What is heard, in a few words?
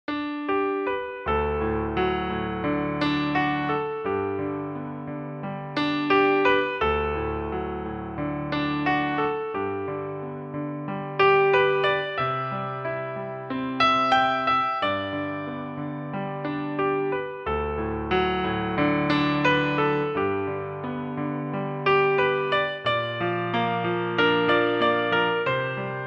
lullaby, keyboard